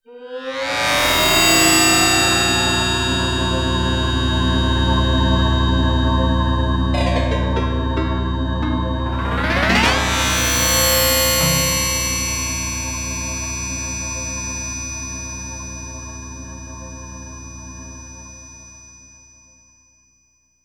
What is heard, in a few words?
Fanfare